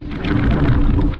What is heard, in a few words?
cry